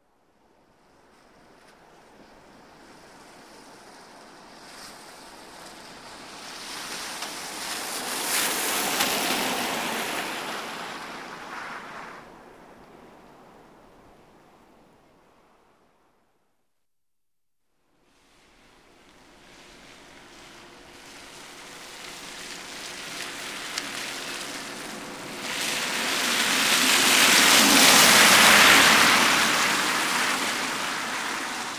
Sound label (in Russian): zvuk dorogi posle dozhdja